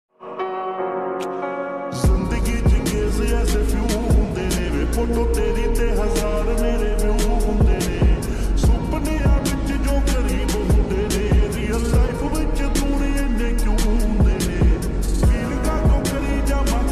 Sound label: ringtone slow reverb new song ringtone punjabi song ringtone